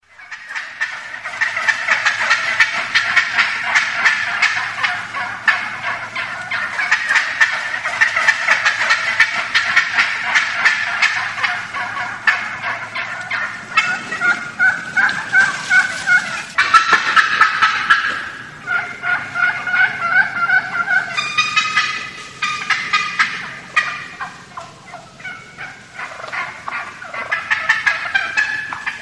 turkey flock; animals

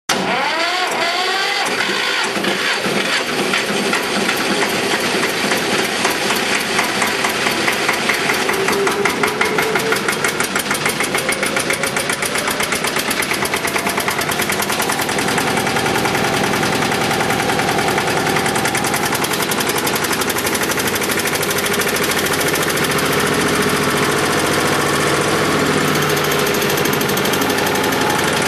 Porsche; Porsche Junior

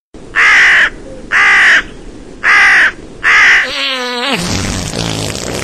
CROW FART

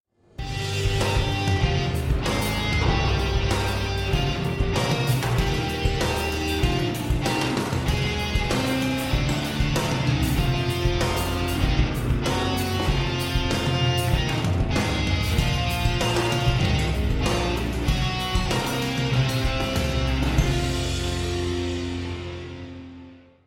Jukebox Rock

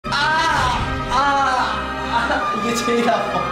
waxing pain

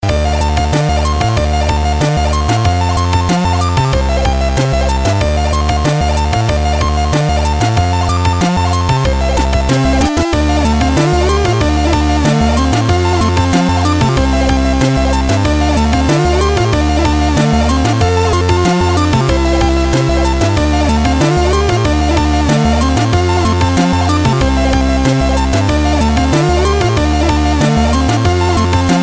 hybrid 8bit; electronic

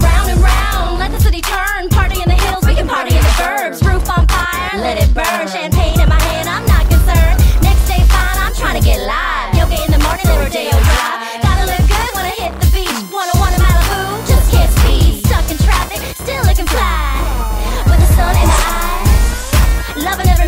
Is Calling Rap

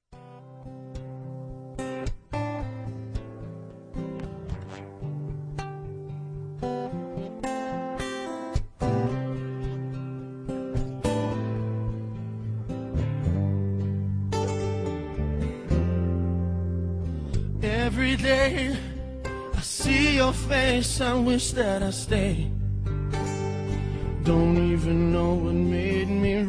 acoustic

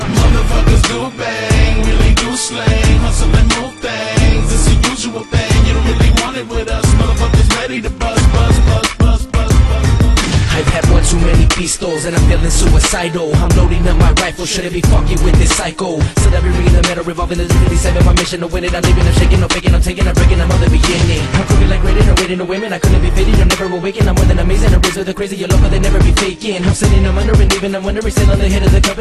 black beat, hip hop

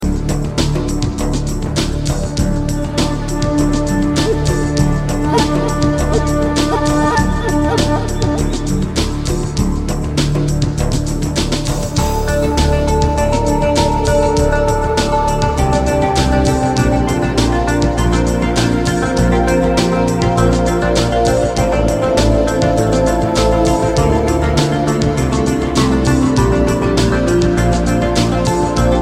melody alarm

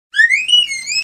Whistle Notes

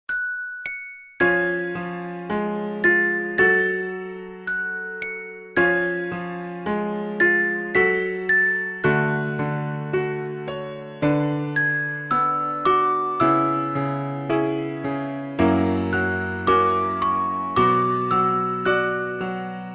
Piano

